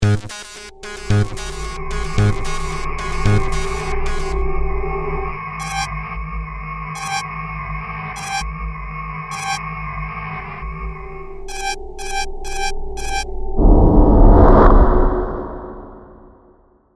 Composition Techno, Production, Element, Composition, Techno, Hits; SoundEffects-BlastWave